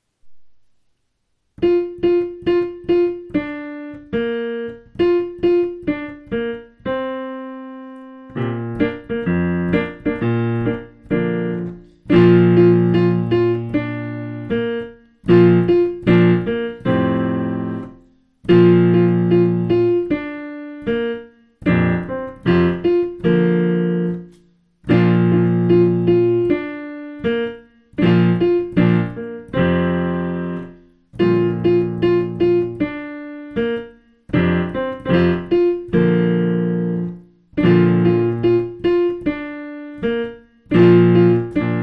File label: piano